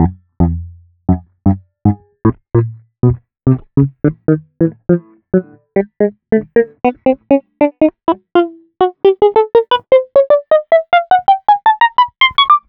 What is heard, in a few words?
Guitar Note Off